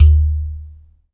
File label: UDU, African Contemporary; Supreme Beats; Spectrasonics; SB Udus